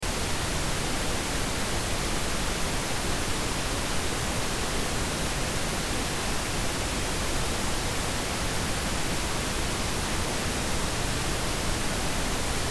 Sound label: Test Tone Pink Noise -12Db Loop; Tones